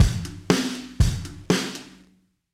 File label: vintage kit phased bitcrusher; toontrack ezdrummer 2